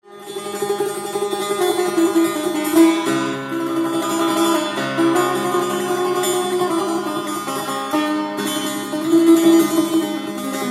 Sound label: setar